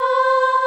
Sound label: CHOIR C4, SYNCLAV VOCAL; Greytsounds VOL-1